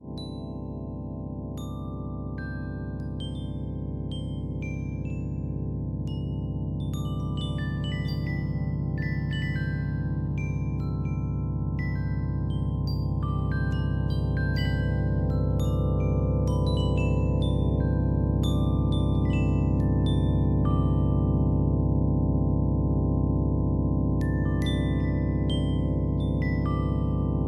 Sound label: galactic xray audio